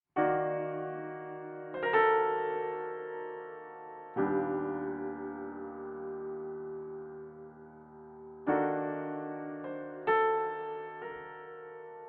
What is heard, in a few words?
tune